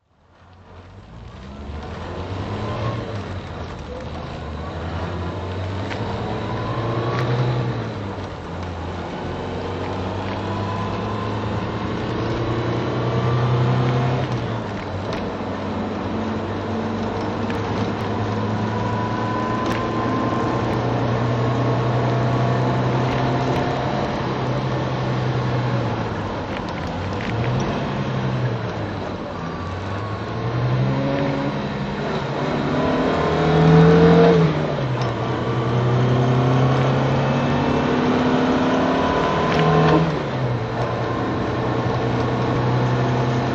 volvo pv544 driving

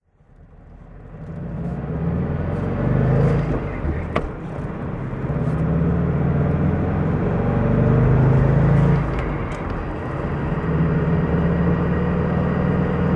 porsche 356c driving

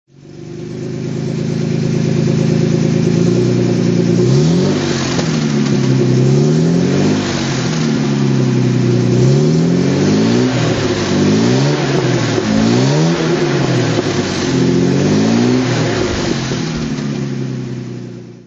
jag mk2 38 throt, Jaguar MK II 3.8; 1961; Jaguar MK II 3.8 litre; Motorgeluid; V3D/ ClassicarImages

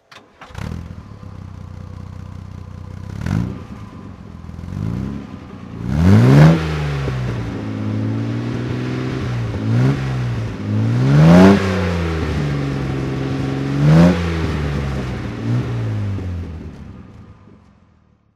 austin healey 3000 mk1 hardtop throttle

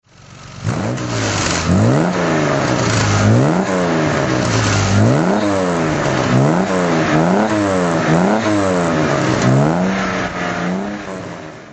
aston db4 throt

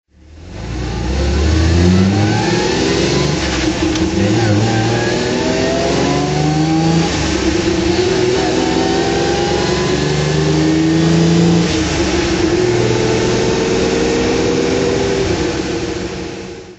austin healey mkI rally drive

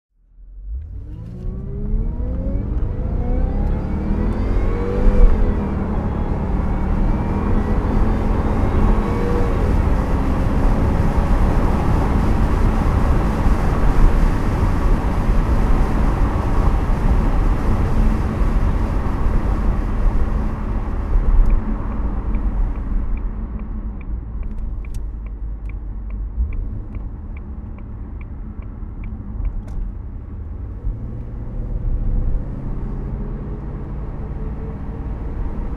jaguar XKR conv driving, Engine sound driving; Jaguar XKR convertible, 2000; V3D/ ClassicarImages

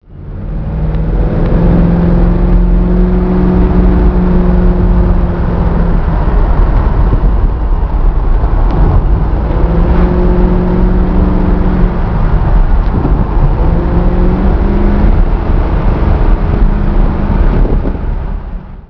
isogrifo drive

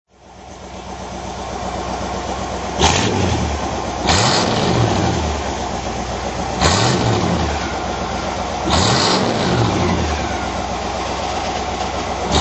oldsmobile 98 throt

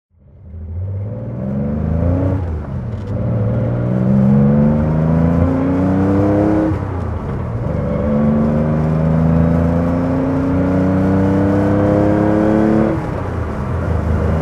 porsche 911 2.2 T rally drive

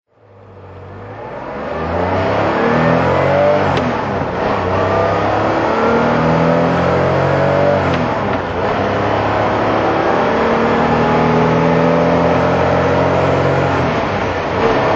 porsche 911T drive

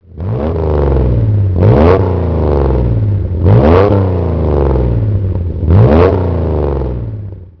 etype; Jaguar; E-type 3.8 litre "lightweight", 1963; Enginesound; (interior); V3D/ ClassicarImages

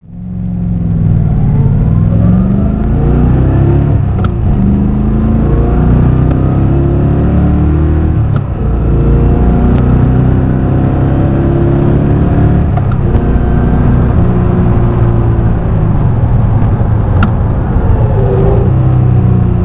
pantera int, classic car

